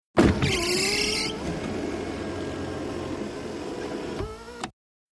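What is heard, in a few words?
Electric Sunroof, sunroofs; accessories sunroofs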